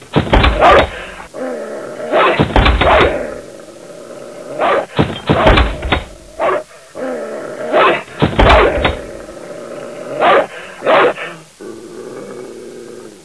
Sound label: danger dog